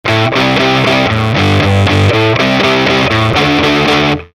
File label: Pop Punk, Guitar Effects Pedals; Pro Co